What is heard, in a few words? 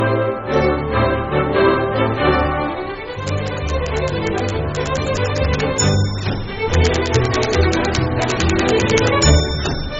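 typewriter playoff music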